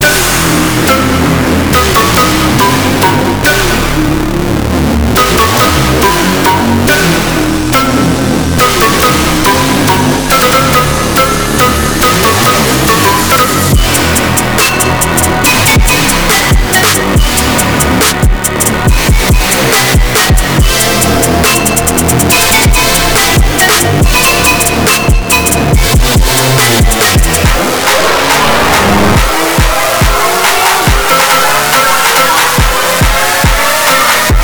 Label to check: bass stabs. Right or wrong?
Right